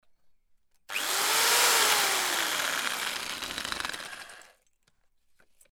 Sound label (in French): son isole perceuse